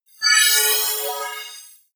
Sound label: appear sparkle positive